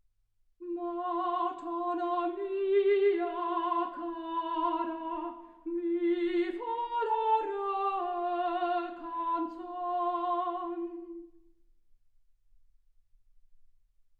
soprano; single mic

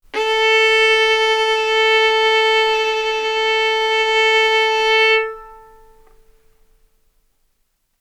Violin arco mf sul A A4 B4 stereo

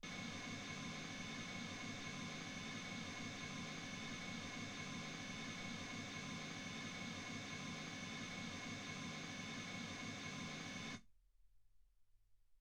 mls16 48kHz; single mic

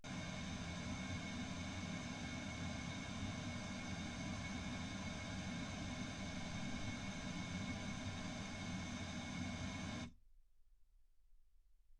pink noise 48kHz

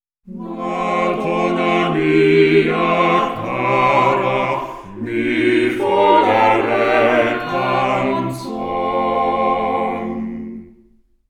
quartet

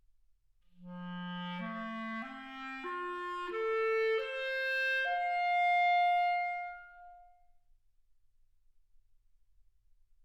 clarinet

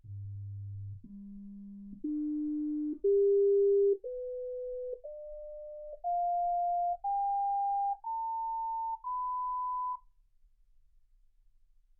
sinus tones 48kHz

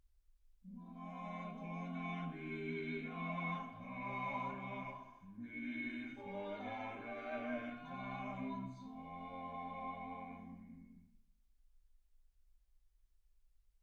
quartet

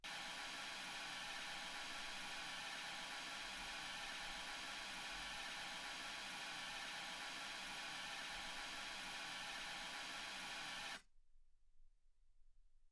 mls 48kHz

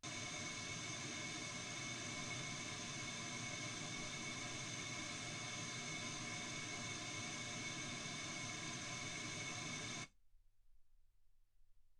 wgn

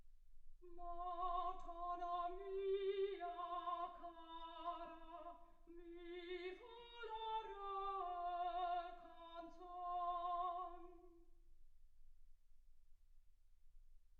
soprano

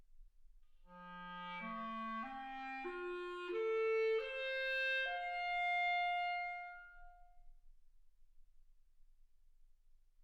clarinet

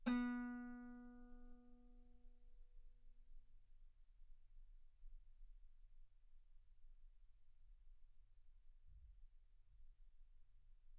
Guitar ff B3; sul B

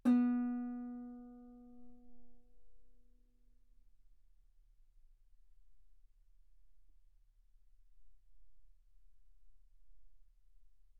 Guitar ff B3

